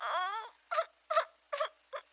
Girl Idle; In the Line of Duty Firefighter

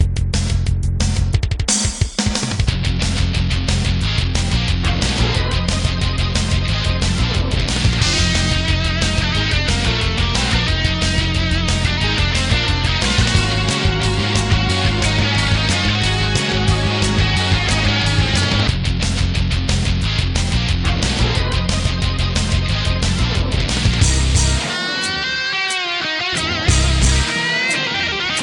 Melodic Pop Rock Theme; No Good Gofers; Williams Pinball

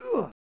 "Ughh!"; Shadowrun; snes

